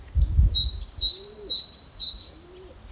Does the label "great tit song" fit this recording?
Yes